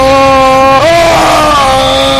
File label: axiaoooooh